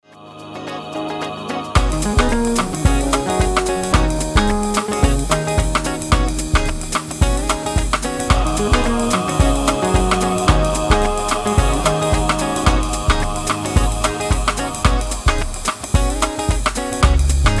instrumental version

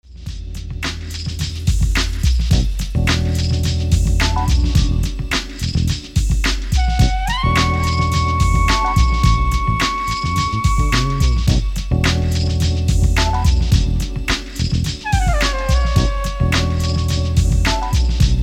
instrumental version

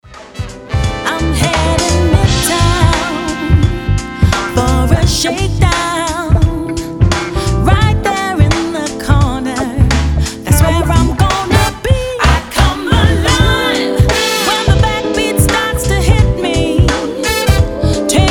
rimshots and basses

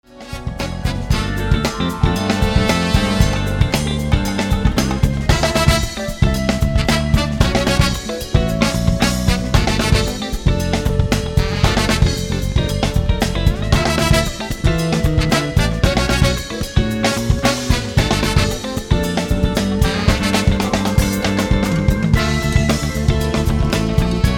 japanese instrumental live version

